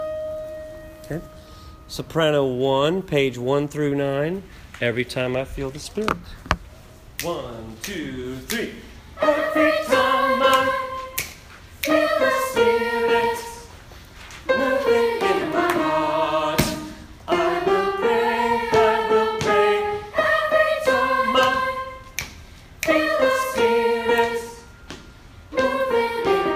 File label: Evry Time I Feel the Spirit Sop, childrens choir